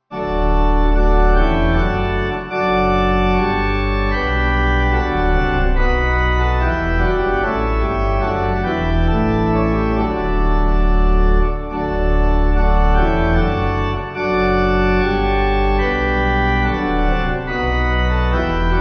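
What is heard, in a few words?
pipe organ hymn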